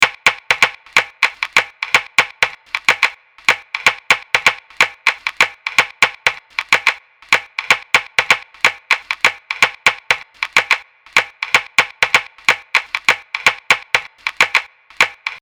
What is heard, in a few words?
percu wood